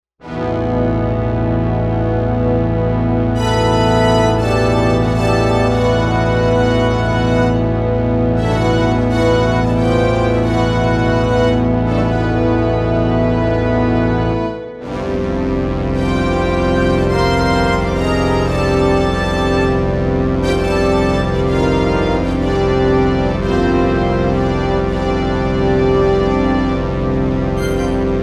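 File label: Brass SYNPHONIC, synth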